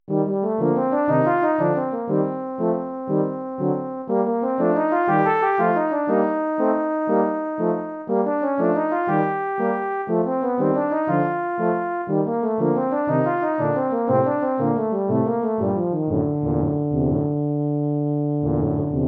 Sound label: Tuba Suite Another March; suite for tuba euphonium quartet